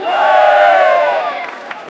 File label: sad crowd says ooh; feedback; sad crowd says ooh and